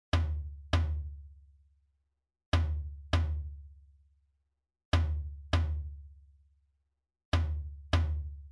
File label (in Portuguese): Toque - Surdo Mor